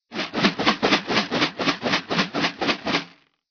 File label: new level